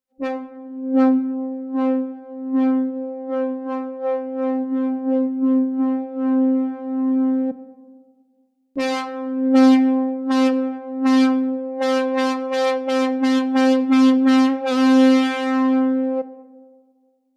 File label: Breath Vibrato